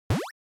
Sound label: NES General Pitch